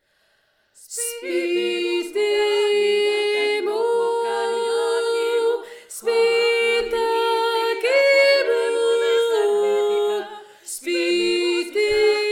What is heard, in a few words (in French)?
voix